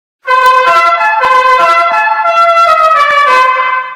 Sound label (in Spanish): la cucaracha